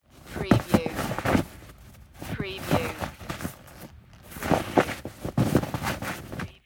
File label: Snow Movement; sound effects bible